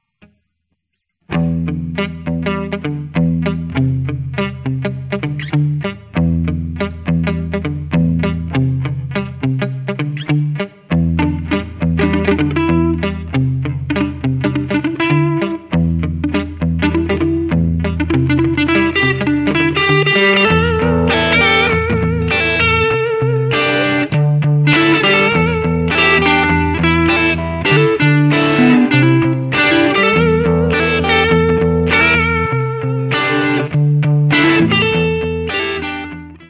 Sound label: Songs For Guitar